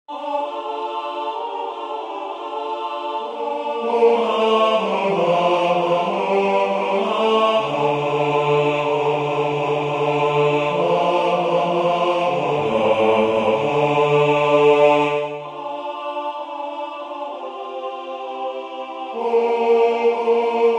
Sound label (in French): Venez Sauveur aimable basse